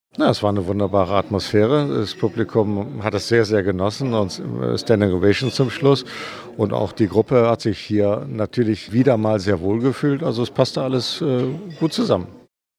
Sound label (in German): acapella auftakt